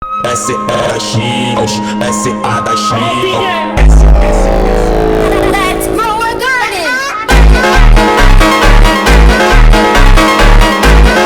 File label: funk